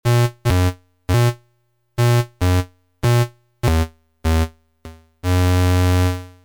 micro cosm bass

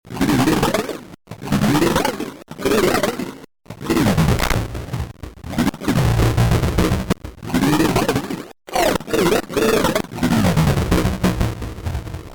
rumble barn piggy

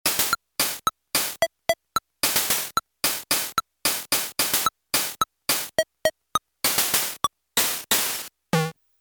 rhythm pitch down

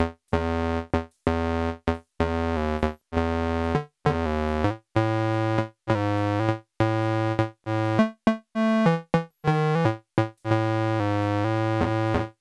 banjo pitch sus; kosmic shade

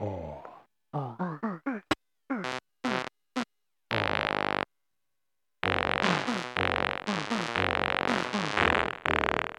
sample mangle

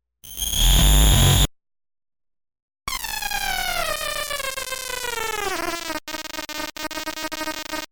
scream; unpredictabox

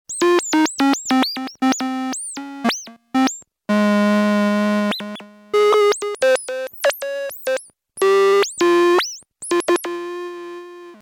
vibrato hyperdrive

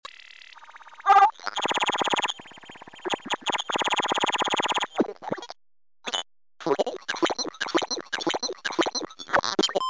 whiz-kid loops

